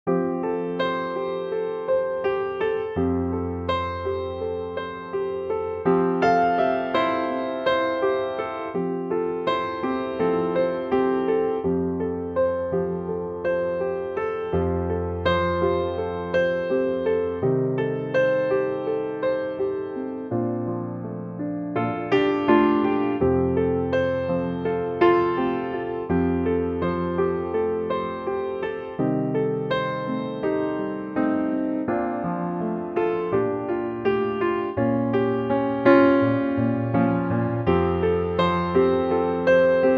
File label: piano F; Pianotrak; key - F - vocal range - original key; piano only arrangement